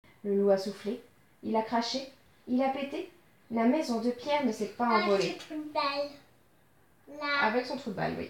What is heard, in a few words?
Vocal